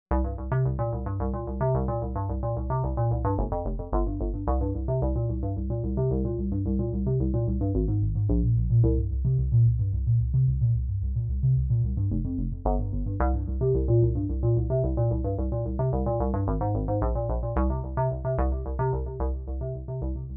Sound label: FM Bass; VIVO S10